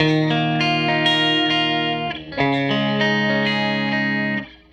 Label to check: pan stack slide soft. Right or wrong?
Wrong